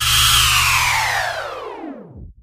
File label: Starship Warp Spd De